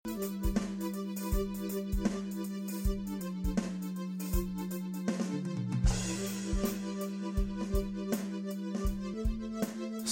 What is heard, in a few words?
Dreamy Groove